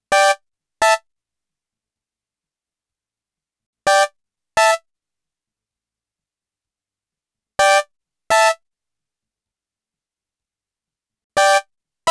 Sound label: Chord Stabs, EDM; Sonic Foundry (Sony Creative Software); Afterhours EDM